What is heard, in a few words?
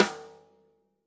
natural sd rim on; single hits